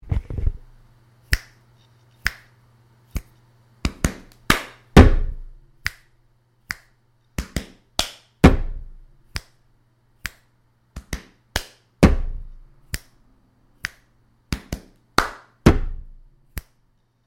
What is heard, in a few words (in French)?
rythme seul en boucle